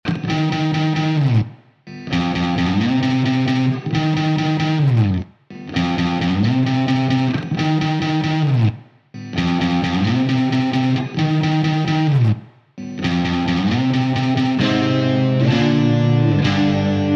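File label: Crunch Guitar Dry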